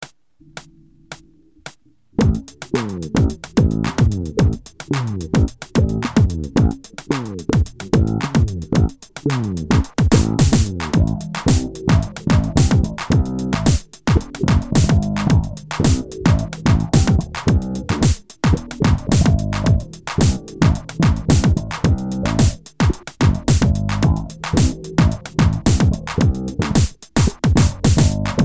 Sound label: wah